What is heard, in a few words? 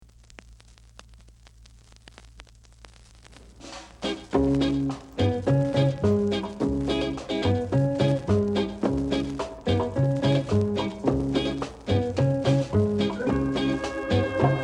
drum